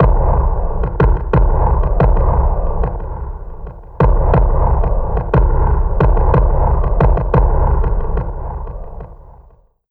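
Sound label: FLOOD bass drum, drum loop; Big Fish Audio; Uncivilised G'rooves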